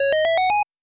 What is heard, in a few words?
Item Appears; glitch in the system